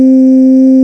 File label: VOICE